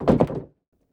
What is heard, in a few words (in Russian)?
dyorganie dveri, doors; FEAR